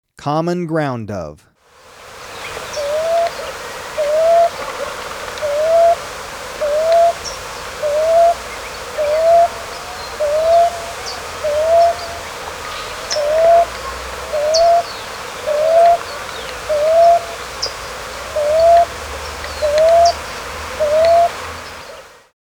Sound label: Common Ground-Dove, Birds of Ecuador; S. America